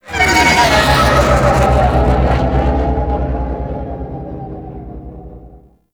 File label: fly by quick; Vehicles; Warframe; Liset; Liset Flight; Nora Liset